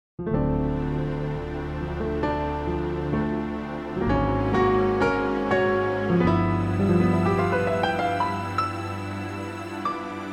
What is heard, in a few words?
Easy Jazz classical; Zweverig jazz/klassiek; piano met 'strijkorkest'